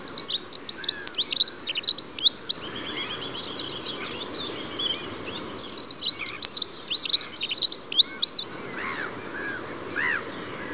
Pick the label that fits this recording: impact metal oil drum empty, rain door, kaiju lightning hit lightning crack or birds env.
birds env